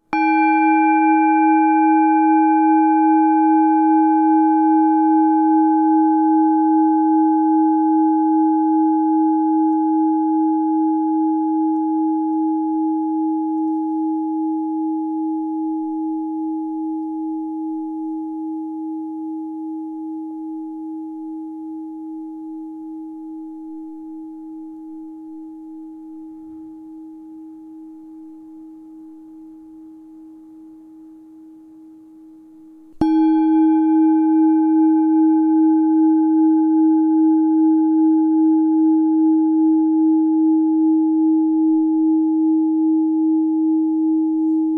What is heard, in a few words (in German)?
Klangschale Anschlagen; Ex Voto